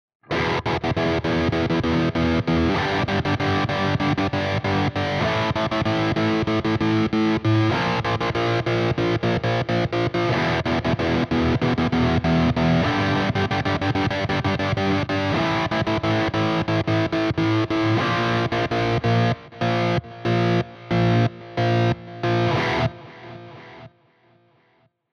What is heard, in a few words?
Cort MBM 2H high gain Kill Switch